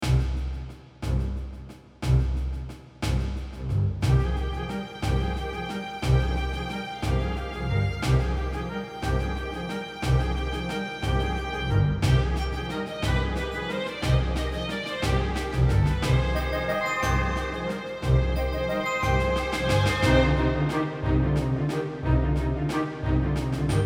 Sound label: Exciting Trailer; Strategic War in Europe